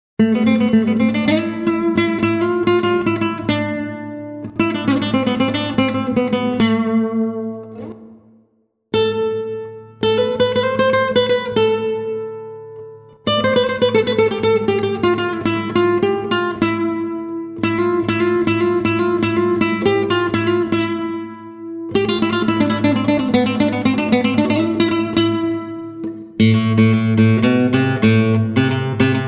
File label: Guitar Solo